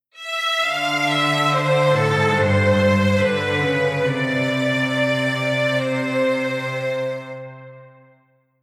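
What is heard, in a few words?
Strings Polyphony Bass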